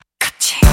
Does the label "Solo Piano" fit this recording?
No